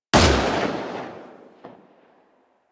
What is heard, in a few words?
Burst Rifle Shot Secondary; Weapons; Grineer